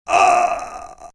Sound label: Die High Damage